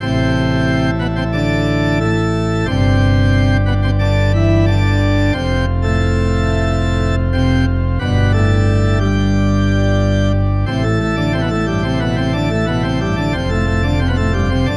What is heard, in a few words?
combat; chuch